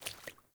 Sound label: Sandals Walk Water, Footsteps; Characters; Player